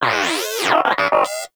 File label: Inquisitive Vocal Enemy Spotted; Pets; Warframe; Moa